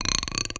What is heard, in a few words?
Bolt Base Rub, Medium Wine Glass